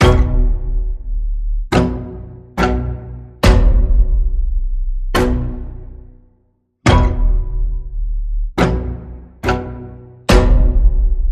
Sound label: String Pulse B, Key of B